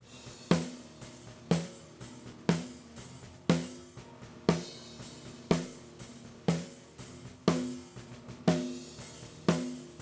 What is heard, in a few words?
Snare Mic Test